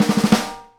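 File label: snare stroke hi; E-MU Producer Series Vol. 8 – Platinum Phatt; Live Snares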